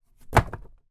foley wood thin light peices drop on top of each other